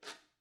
guiro short; Idiophones; Struck Idiophones

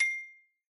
Xylo Hard C6 pp far, Hard Mallets; Idiophones; Struck Idiophones; Xylophone

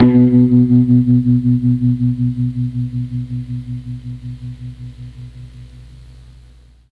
B1 guitar, MKII mellotron

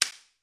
slapstick

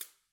Cabasa Hit; Idiophones; Struck Idiophones

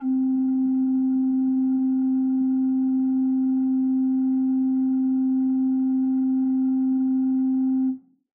Renaissance Organ 8 foot Room C3; Aerophones; Edge-blown Aerophones

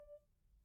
Grand Piano Main Release D4, Kawai; Chordophones; Releases; Legacy

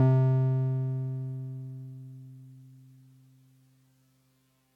Piano 1 C2, TX81Z, Electrophones

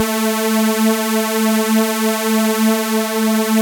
Super Saw A3

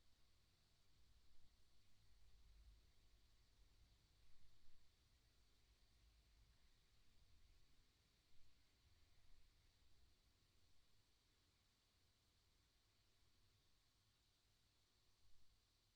blower, pipe organ; Aerophones; Edge-blown Aerophones

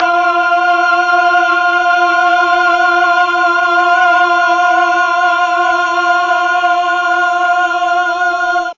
F4 8VOICE CHOIR, mellotron

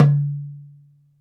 Darbuka hit, struck membranophones

